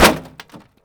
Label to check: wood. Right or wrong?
Right